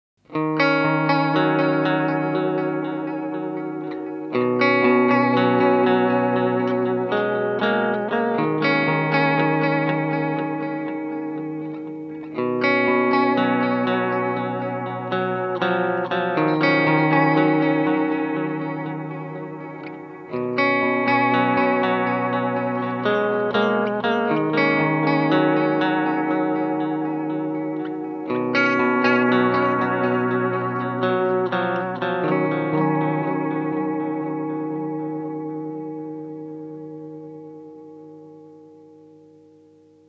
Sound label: Boss SDE 3000D vibrato modulation